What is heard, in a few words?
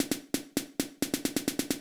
Beat, Old Skool House, 132bpm